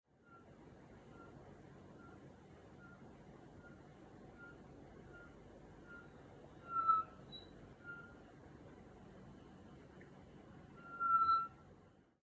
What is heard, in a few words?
pert tree frog male calling